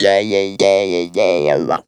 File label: "yeah yeah", Funky Vocals; USB Soundscan vol.18; TB MELODY